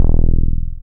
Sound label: BAS MKS-50, Roland